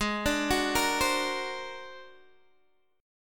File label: Ab 9th Flat 5th Ab down Guitar DADGAD